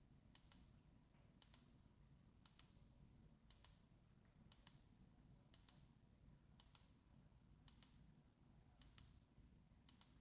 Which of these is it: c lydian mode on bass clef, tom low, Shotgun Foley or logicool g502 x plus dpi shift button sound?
logicool g502 x plus dpi shift button sound